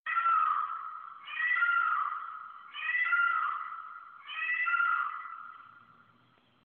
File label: Peacock